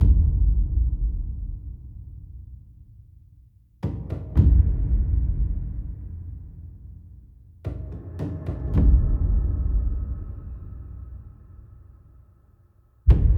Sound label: music jukebox